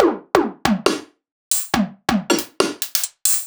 Bpm138 Top Loop, Dubstep; Doar

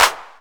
Claps & Snares

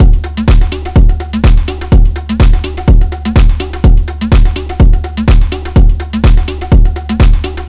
125 BPM, Digital Breaks; Ridm MPC; Loopmasters; Jon Carter & Alex Blanco; Block Party Essentials

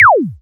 Perc, Dubstep One-Shot Drums

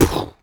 Human Beatbox, Beatbox Hits

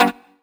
Synth Shot F#; Mutekki Media; Vengeance Electroshock Vol.2

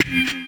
F STAB, funky

